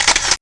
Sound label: webcam Snapshot